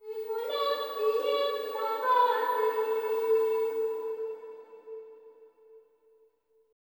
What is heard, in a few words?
LTLE GIRL, AFR.GIRL; Spectrasonics; Heart of Africa 2